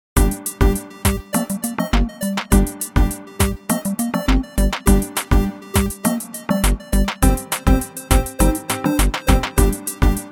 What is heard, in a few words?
dancehall